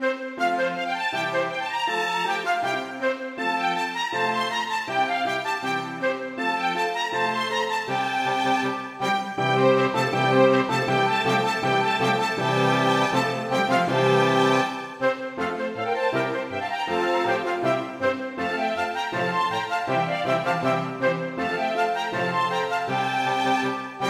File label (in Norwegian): Marsch for stort ensemble; Aamodt